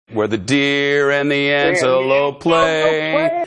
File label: singing where the deer and antelope play; shepard smith